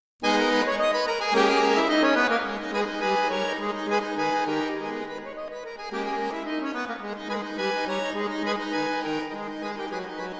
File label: Preludium gis moll; J. S. Bach